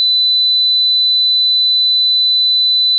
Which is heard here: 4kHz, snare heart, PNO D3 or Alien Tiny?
4kHz